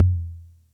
Music Percussion Computer Tom, Drum Machines

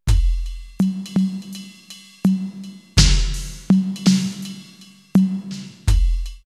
R8 Slow Easy, drumloops, DrumMachines; X-Static Goldmine

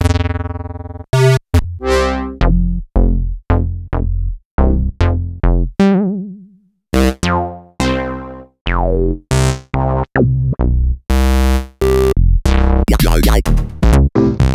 Synth Basses; Sliced

